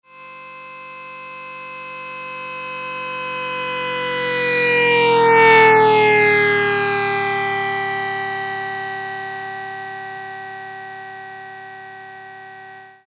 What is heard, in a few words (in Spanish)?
claxon 200 kmph; movimiento